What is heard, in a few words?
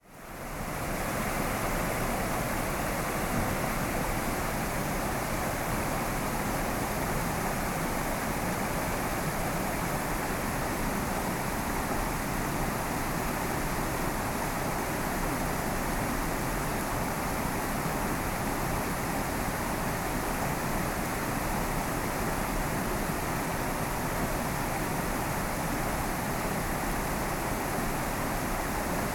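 rushing mountain stream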